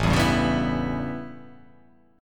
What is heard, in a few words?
A 9th, down